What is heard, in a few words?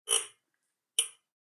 Chair Squeak; SoundsCrate